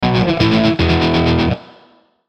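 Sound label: Musical Element, Drum Snare March; Blastwave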